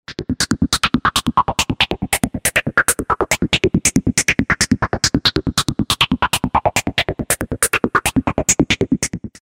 phase

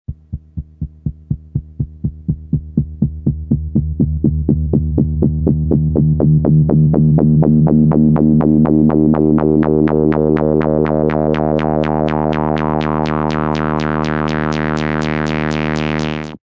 multimode

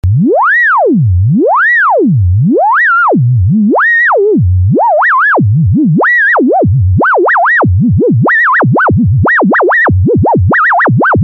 Sine LFO

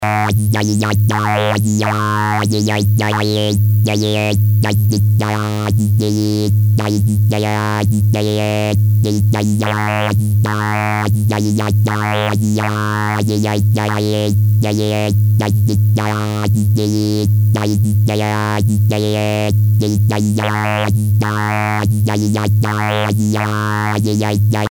formant

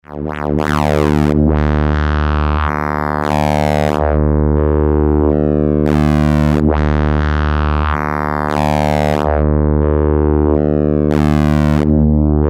morph

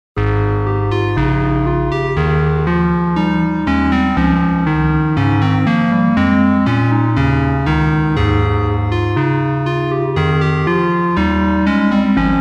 Quad LFO

